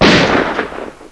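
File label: Missile sound; Soldiers 2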